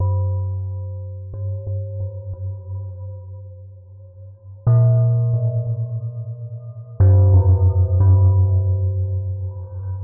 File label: Bells 19 EDO